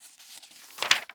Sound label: Turning Paper Page Long